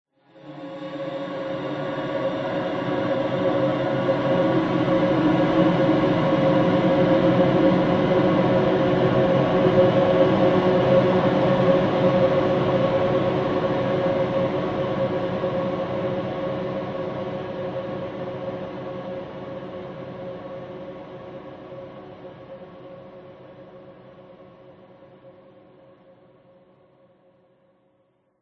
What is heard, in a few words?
horror swell long angelic choir behind psychotic evil drone; zapsplat